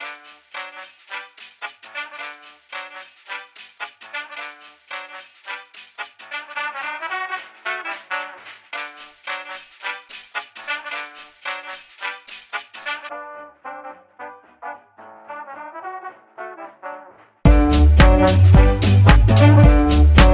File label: electro swing